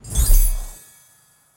Animations Repair Large Old

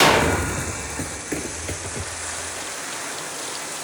Fire Hydrant Water Spilling In; Props Fire Hydrant Water Spilling In

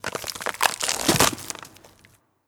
Revive; Skell

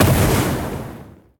Rocketland; Likho

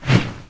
Dash; Superbrawl Universal Dash Sfx; Nickelodeon Super Brawl Universe; Android

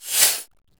Panel Transition Out

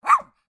Dog Poodle

